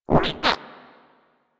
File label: Mine Osprey Idle, Dialog